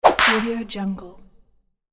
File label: whip